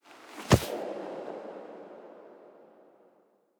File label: Steps Operator Close, Foley; Warframe; Cinematics; Apostasy